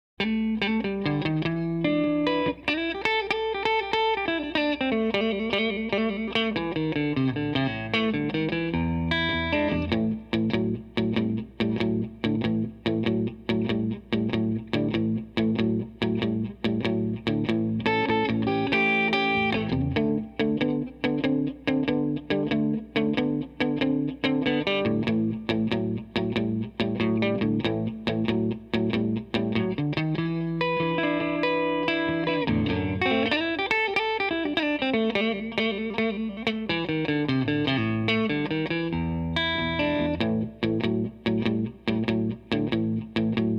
A March, harmonica; Blues Harmonica Masterclass

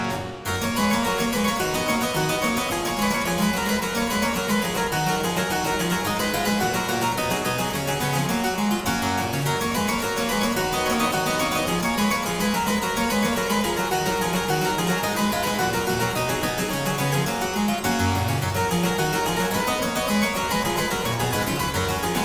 Aria Variations in G Major, Harpsichord

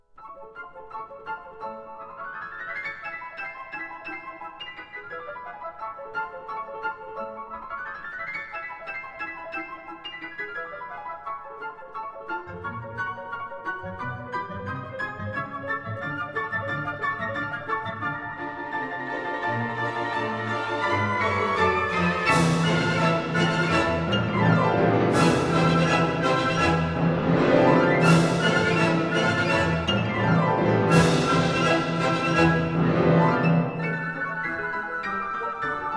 Piano Concerto Allegro animato; Liszt; Piano Concerto No. 2

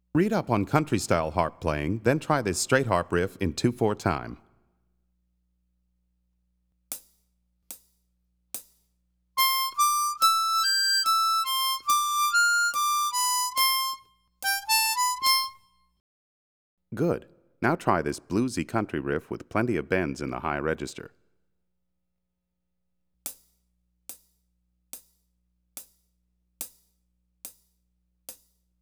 Country Harmonica; Play Harmonica

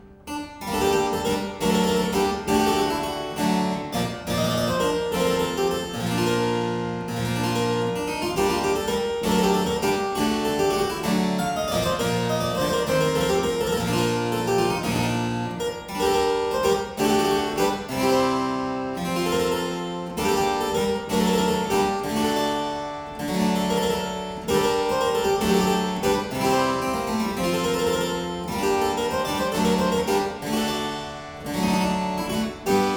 harpsichord; Byrd; The Second French Coranto, BK21b

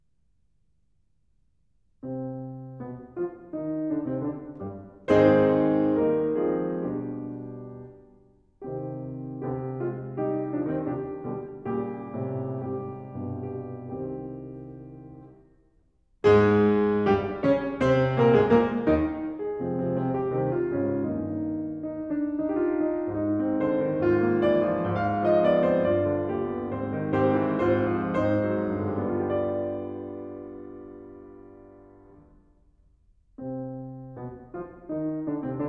Small Piano Pieces, Andante con gravita; Grieg; The Complete Music For Piano

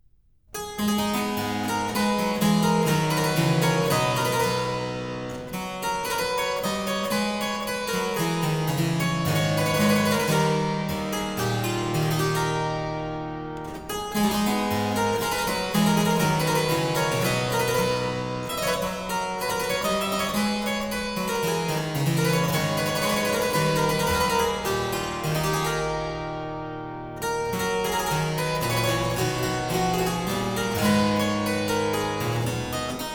Courant simble in A Minor, Harpsichord; BuxWV 245; Variatio 6; Buxtehude; Opera Omnia; Harpsichord Works

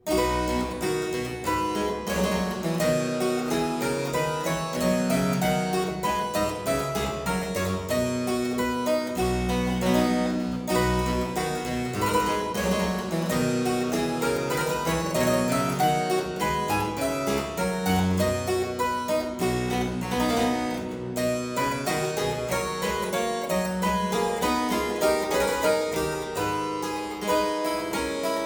Aria in C major - Variatio, harpsichord

